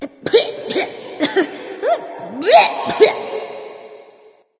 clear throat light; smite; voicepack; hel

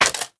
clap; smite; arthur skin9

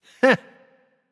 hehheh; smite; voicepack; osiris

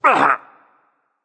grunt hit berserk; cuchulainn

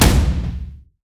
drum; smite; god raijin

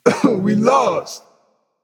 boo hoo; smite; olorun

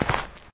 footstep; smite; apollo v4 skin14

